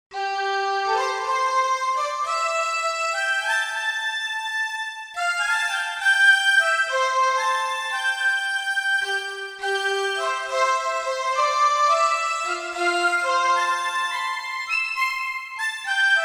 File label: Sweet Fiddl, Korg MSC-1S; Korg MSC-1S Variety